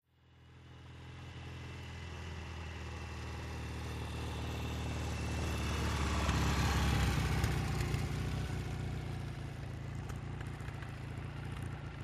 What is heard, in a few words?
Mcycle Norton Slow By